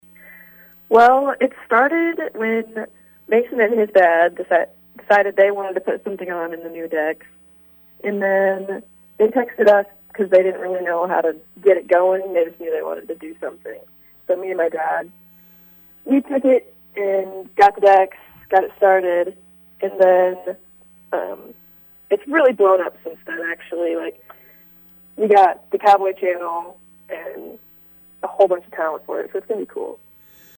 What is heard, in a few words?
JAZZ